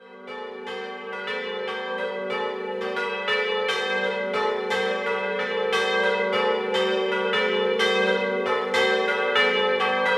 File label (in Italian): Maseris, campane